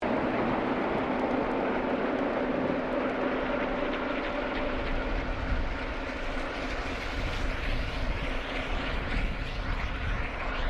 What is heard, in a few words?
Boat Ferry Freshwater Class Sydney Engine Sailing Water Noise Submerged; Manly Ferry; Boats; Vehicles; SoundEffects-Foundation1